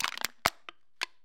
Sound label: soda can twist; soundscrate